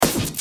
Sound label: Snare; Fighter Beat